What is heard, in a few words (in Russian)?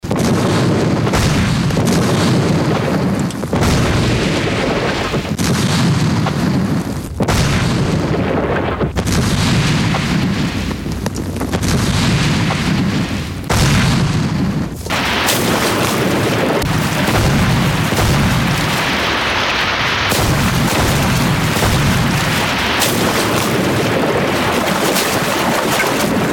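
zvuki vojny, war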